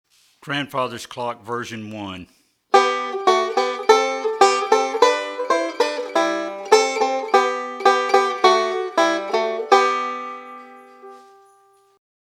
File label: Using Thirds And Sixths